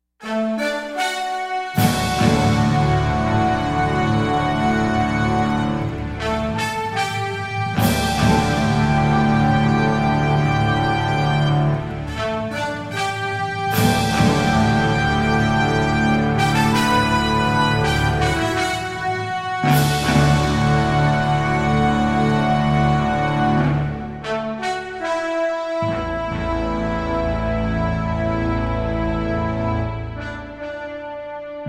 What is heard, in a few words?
Into Space Fanfare, orchestral